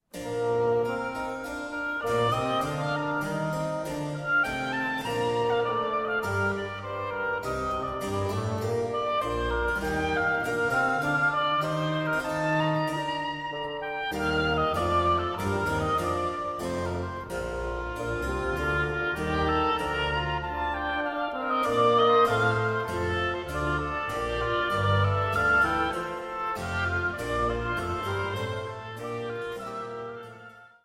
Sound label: Sonata In Bb Major for two oboes, bassoon, continuo; No.3